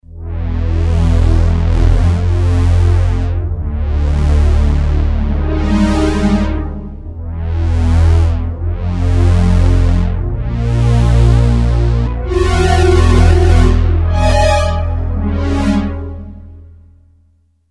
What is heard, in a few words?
Ominous, Roland Alpha Juno-2; Factory B